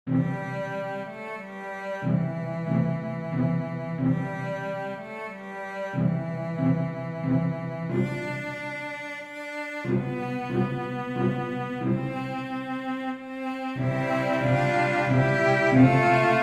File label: cello quartet; Silent Night